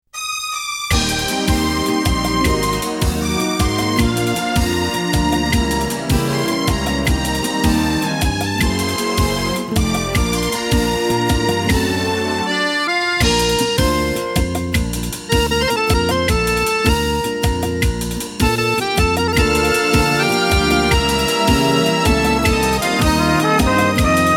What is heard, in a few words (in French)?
trompette accordeon